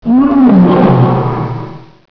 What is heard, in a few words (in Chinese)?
豹子; 动物; 兽类; 特酷声音素材库